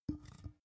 big can empty put down

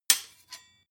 sword hit scrape

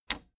plastic button latch loose push enable

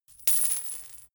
coins drop hard

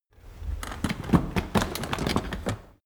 cart medieval drag start stop

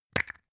plastic spray can small open clatter short

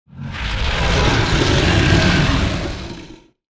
monster growl

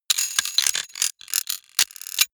robot rustle click

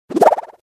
move fluttered small

